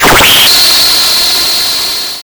Charge

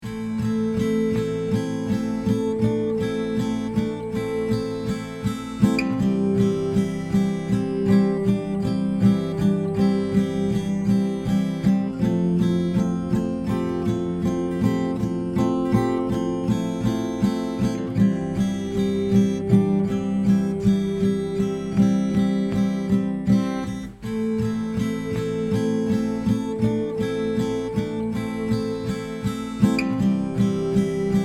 Guitar Glass; Laika Aged Through Blood; Windows

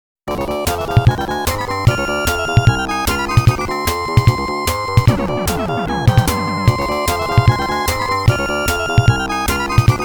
Battle; Phantasy Star

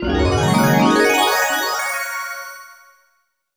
Clear Fanfare!; puyo puyo 15th anniversary nds gamerip

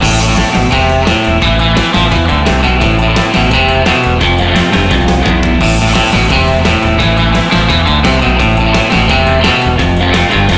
Music Surf; Learn to Fly 3

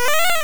Charm; Pokemon; Gen 2; attack moves; GSC

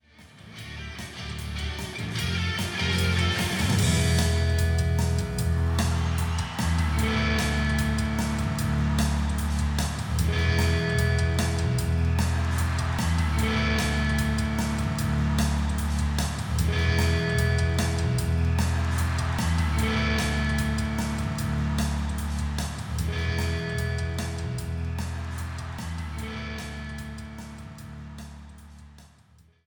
Baseball BGM

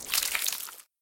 hit liquid

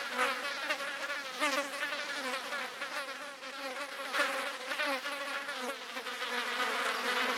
Flies Buzzing

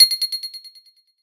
Picked Coin Echo; Nenadsimic; grow in the hole

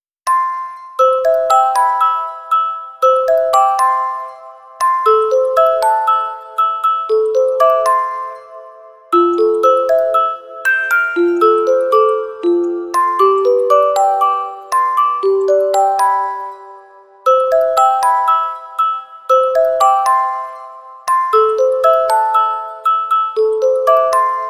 Orgel; supreme candy

